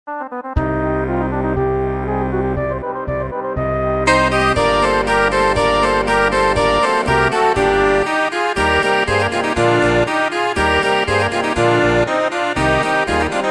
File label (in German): Tuerkischer Marsch